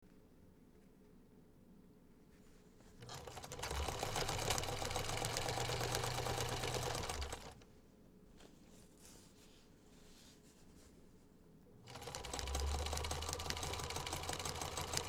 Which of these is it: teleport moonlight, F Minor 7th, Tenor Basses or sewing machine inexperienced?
sewing machine inexperienced